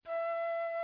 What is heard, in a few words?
flute